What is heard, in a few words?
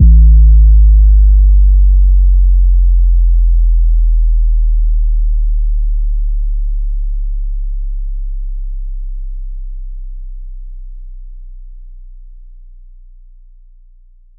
phonk kicks oneshot 808 A; trap kick drums